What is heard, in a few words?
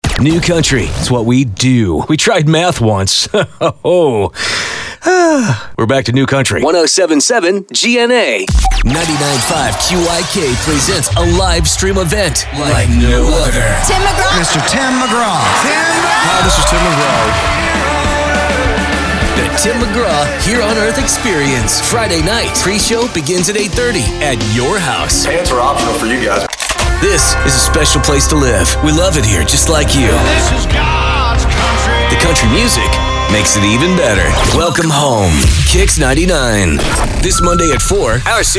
Country